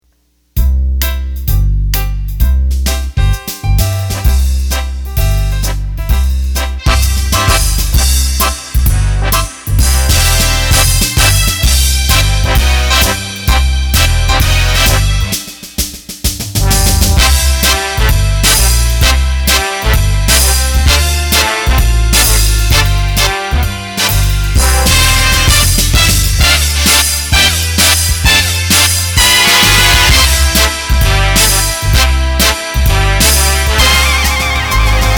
Foxtrot, accordion; roland bk7m

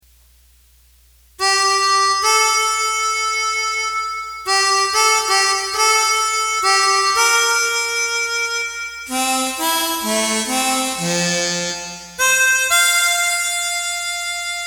ketron Harmonica

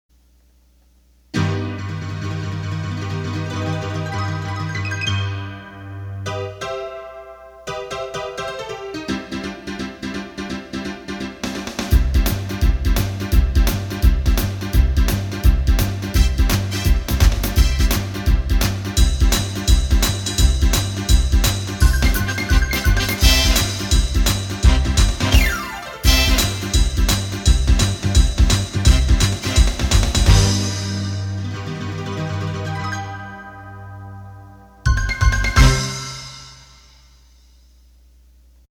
Honkey Tonk

